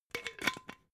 glass bottle massive close cap

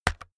keyboard external key hit fast

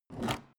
canister empty pick up

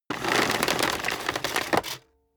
vacuum cable pull in

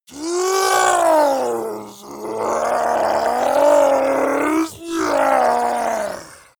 zombie human change

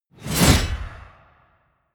sickle cut

